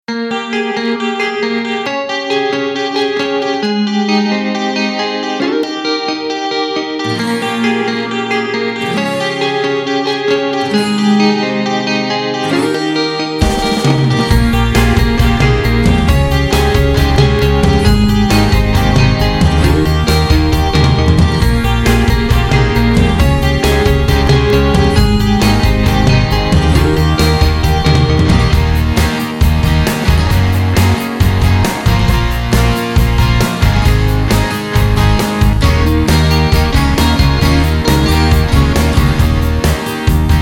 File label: Instrumental